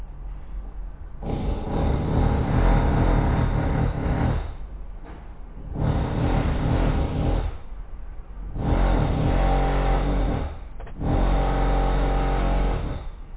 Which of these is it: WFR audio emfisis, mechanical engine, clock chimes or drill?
drill